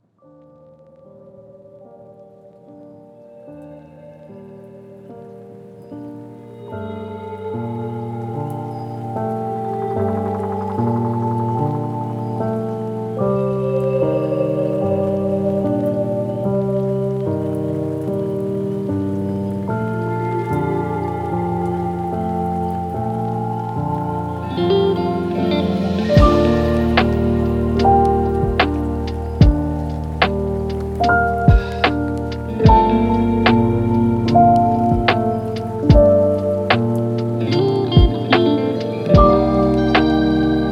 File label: lofi hip hop